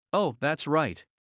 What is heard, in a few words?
Heart fast; Classroom humanbody